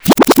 Re-Rewind

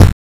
Hard n Brite, One Shot Bass

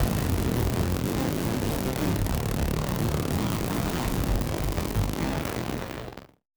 Malfo Syn, malfunctioning synth

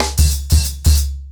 90BPM, ACOUSTIC DRUMS

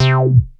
Bass Synths hits and octaves; FM 57 - The Ultimate Sample Collection (1997)

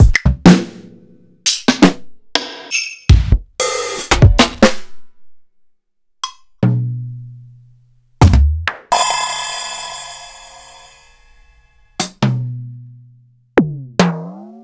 EMU PX-7; m8

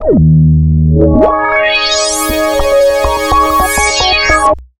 RI SYN, Roots Funk; USB Soundscan vol.41; AKAI

